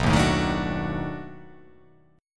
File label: B 7th Sharp 9th, down